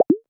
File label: drip down